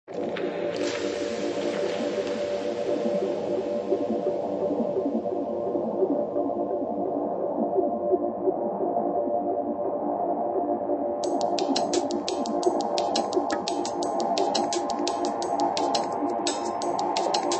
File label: Underground DnB; Touch Loops